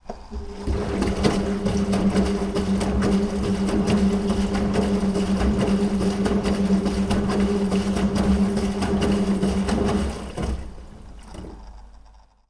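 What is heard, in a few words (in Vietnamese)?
tiếng máy giặt áo quần đang vắt nước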